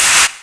Clap; Play Girl

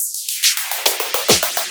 Loops Fills; Vengeance Samples

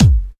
Bassdrums; Vengeance Samples

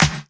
Claps

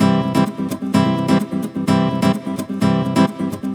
Nylon Guitar - C maj, 128BPM; Vengeance Samples; Special Sounds; Nylon Guitar Kit

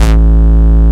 Bass Root A

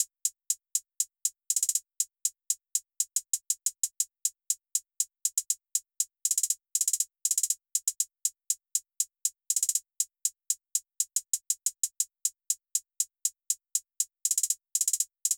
808 Hatz 120 bpm

